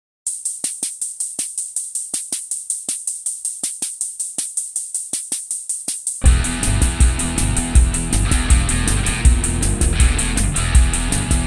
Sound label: rhythm II